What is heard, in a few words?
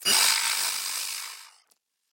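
rattly roar; soundscrate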